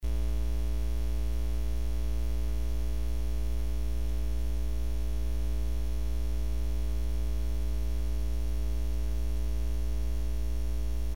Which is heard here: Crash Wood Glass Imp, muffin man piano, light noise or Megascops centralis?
light noise